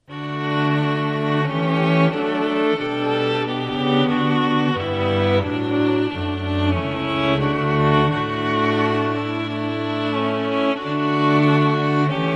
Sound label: Strings Short